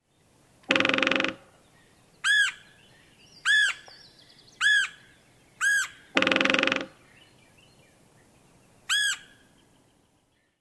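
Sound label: drums and querr calls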